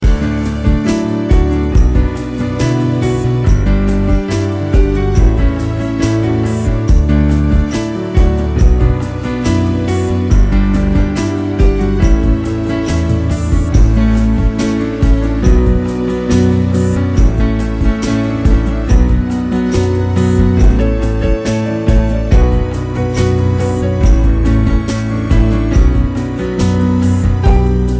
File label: Romantic Piano